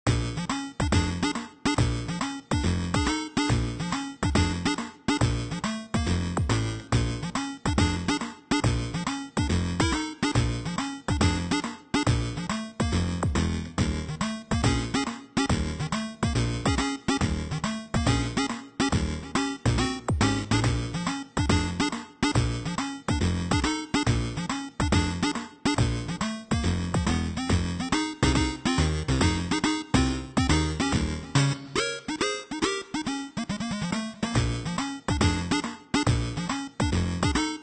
jolly; super stickman golf 3

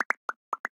Slime Aggro

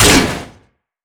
Fall Metal

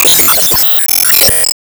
Enemy Waterplant Active Hit; disney star smash android ios mobile gamerip 2020

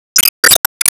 Multiball Build

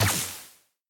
Drift Ind Initial

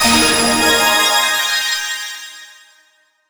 Slot Big Win

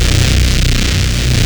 Bass

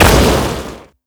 Shoot; HotHead SFX

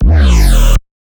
Bass Tech Phaser 160 D#

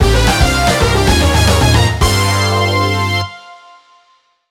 Music event; Power Pro Kun Pocket R

